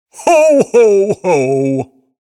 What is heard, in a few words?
Hohoho